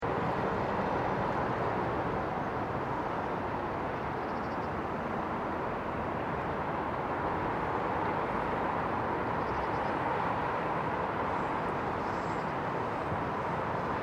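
Distant City, Distant city ambience. Use as city wash outside apartment window etc.; Distant city traffic; City Sounds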